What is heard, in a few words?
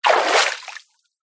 nature water movement hand